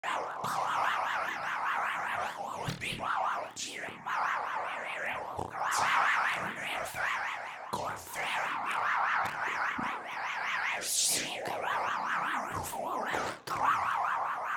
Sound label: spell